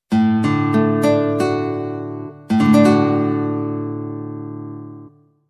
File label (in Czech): Akord D dur